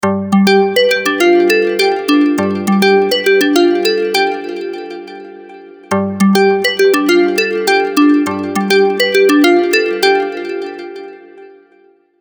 Melodic Sojourn; TCL; A2; alarms

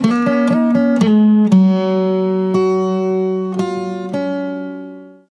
sms guitar; Mudita Pure

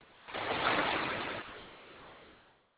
Beach; Audiovox CDM-9900

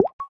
End Key, ui

